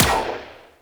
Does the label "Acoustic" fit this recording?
No